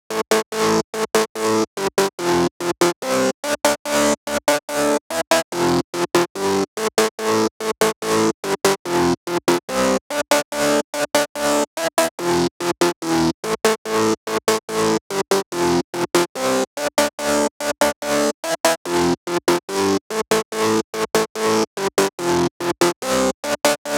144 BPM Chorus - Acid DRY; Vengeance - Essential Clubsounds Vol.5; Flight Kit Root Amin; Melody & Synth

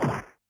PUNCH, Yamaha DD-65

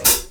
hat, Live Snares; PlatinumPhattCD1; E-MU Producer Series Vol. 8 – Platinum Phatt

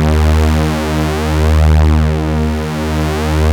OB D#3 -R, Rare Synthesizer; Hollywood Edge - Giorgio Moroder Rare Synthesizer Collection; OB 4 VOX.

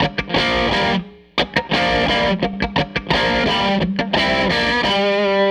Tele Guitar Loop

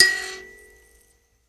PLK Grand Mbira

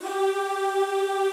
G3 L, Classical Choir; Best Service; Extended Classical Choir

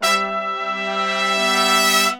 100, BRASS SAMBA; Best Service ProSamples vol.14; AKAI

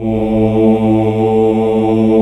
male voice A1; AKAI S-Series CD-ROM Sound Library VOL-2

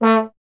trombone; Miroslav Vitous; Solo Instruments

